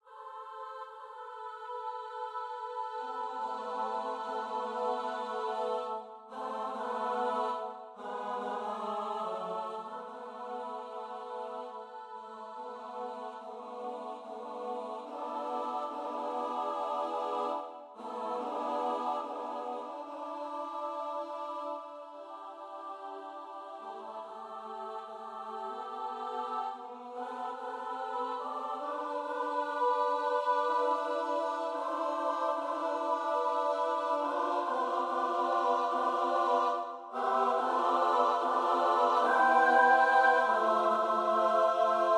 Chamber Music

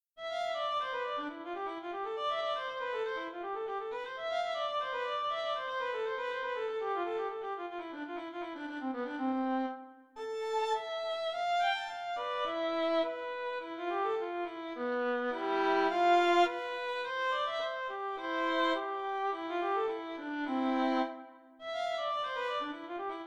Caprice for Solo Violin

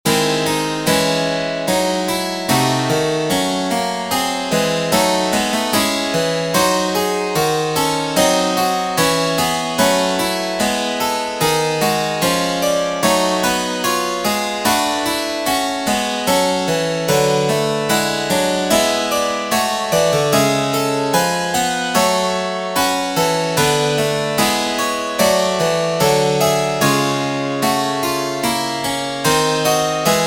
Chorale in C-sharp minor for Keyboard

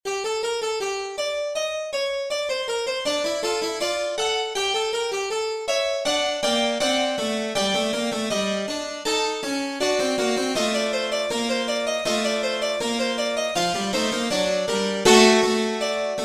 Piano

